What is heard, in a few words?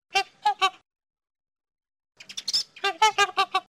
trumpeter swan